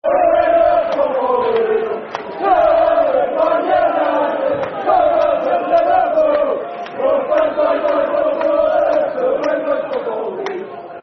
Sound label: Football Chant (Soccer Song); From The Banks Of The River Wear; Sunderland Safc